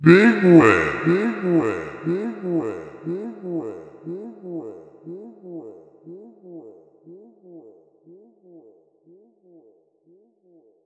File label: Big Win; Fruit Chutes